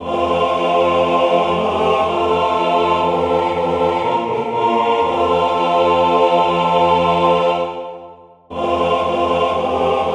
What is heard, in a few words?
drummer half